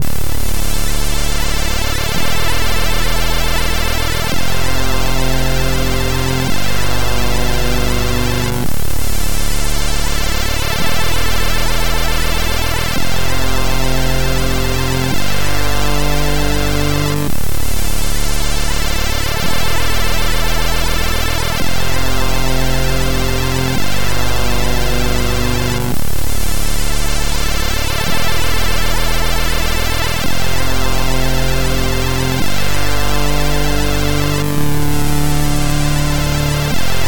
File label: Snare